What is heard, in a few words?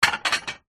household stack of plates dishes set down on wood surface